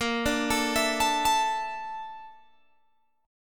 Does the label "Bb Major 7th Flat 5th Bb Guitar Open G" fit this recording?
Yes